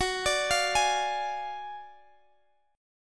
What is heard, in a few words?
D Diminished F sharp